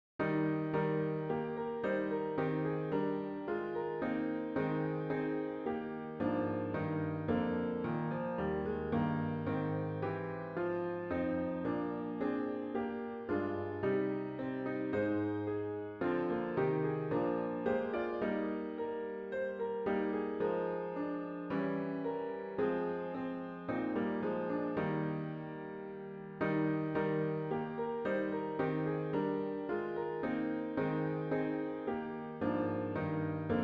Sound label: piano only; How Clear Is Our Vocation Lord